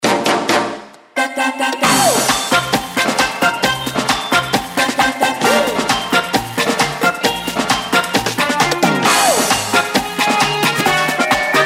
Ringtone, Samsung GT-C3110; Southeast Asia

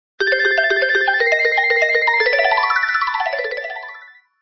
Alarm; Nokia; 6708

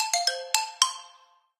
Marimba, notifications; Honor; MagicOS 7.1

